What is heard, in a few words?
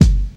90s sharp rap kick drum g key